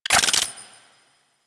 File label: PvP Ammo Respawn; UI; Pickups; Warframe